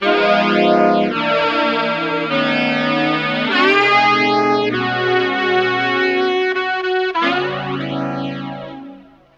sax; Phonky

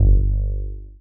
peavy, Bass

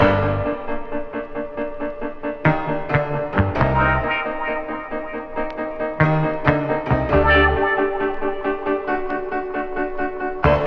piano guitar, loops, phonky

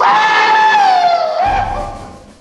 "oohhhhhwow", voice; ooh ahh

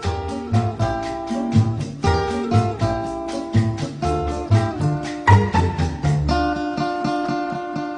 guitar zippy, Loops

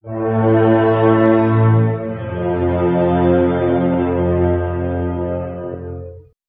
strings two tragic notes, Sad Loops

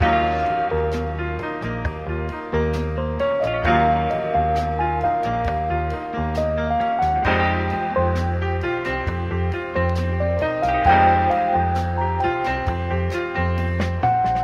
keys, Loops, Sad

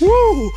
"woo", male

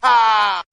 "ah"

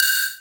sub, Bells; Misc Percussion

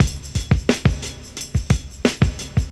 valley of beats, drum loops, boombap

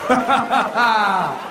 laugh

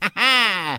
"ha ha", Voice

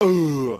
"oouh", ooh ahh

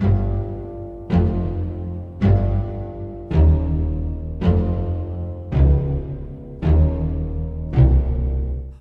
bass, Sad

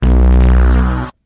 eeyore, zaps and beeps

